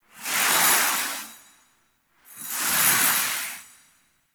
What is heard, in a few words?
Foley Idle Rabbit Pet; Ambience; Gameplay; Teshin; Warframe; Duviri